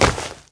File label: Step Grass Layer; PEAK